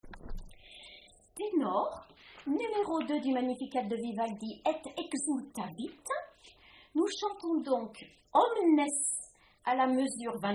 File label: Magnificat Tenor; Vivaldi